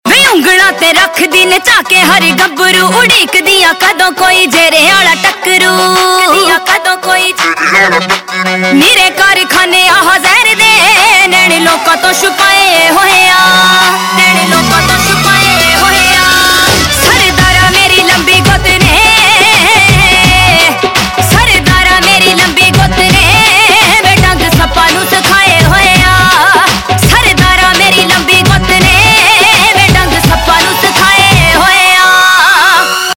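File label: Ringtone, Punjabi Pop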